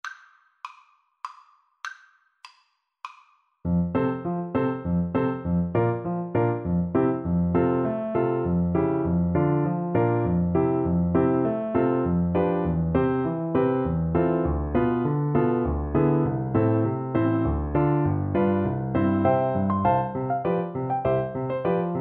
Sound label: French Horn; Brahms, Johannes - Kleine Hochzeits-Kantate, WoO 16